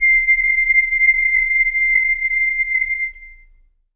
reed acoustic, clarinet

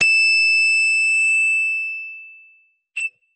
guitar electronic, distortion guitar

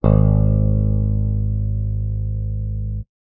guitar electronic, clean electric guitar

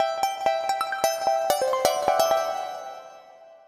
glassy arpeg, edm arp samples

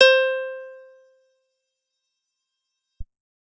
guitar acoustic, acoustic guitar nylon

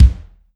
kick, rock drum kit

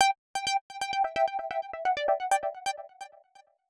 synth, edm samples

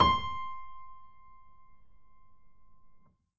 keyboard acoustic, acoustic grand piano

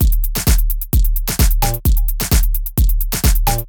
punchy, edm drum samples

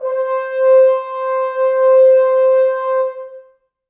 brass acoustic, muted trumpet

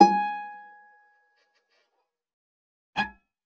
bass acoustic

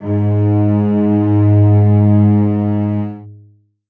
string acoustic, cello

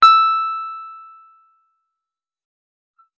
guitar electronic, clean electric guitar

